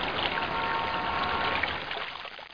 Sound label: FOUNT; Custom 3D Home